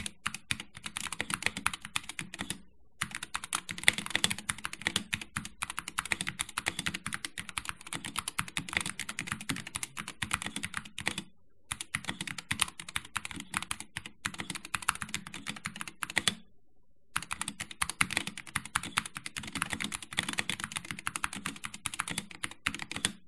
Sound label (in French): enregistrement son steelseries apex pro mini wireless